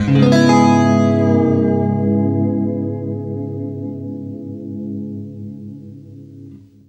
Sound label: FLESH CHORDS, Guitar